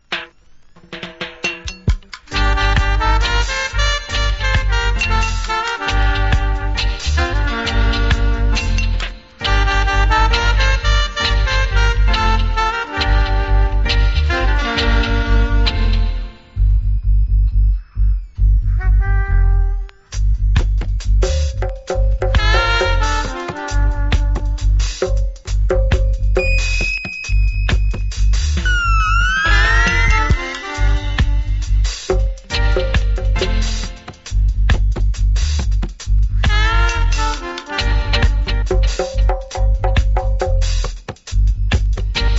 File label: Roots Dub